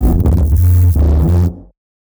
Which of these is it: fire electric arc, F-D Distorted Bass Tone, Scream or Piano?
F-D Distorted Bass Tone